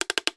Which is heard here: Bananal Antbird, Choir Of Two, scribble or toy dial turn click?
toy dial turn click